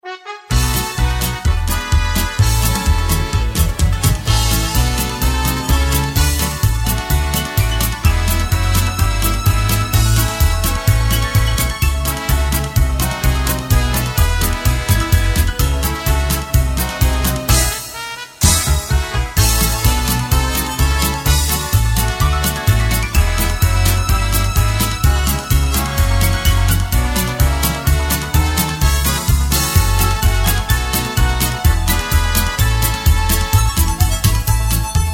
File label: music